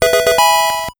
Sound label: Jingle - Get Item; Pokemon Sword Beta